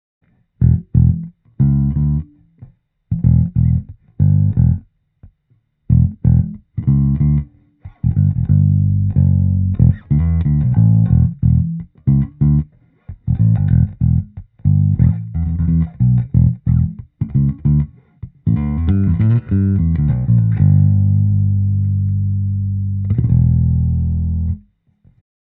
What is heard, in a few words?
Bass Amp close; united UT